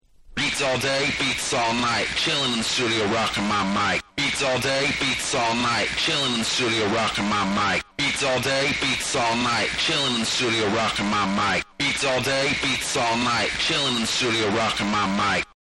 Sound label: Acappella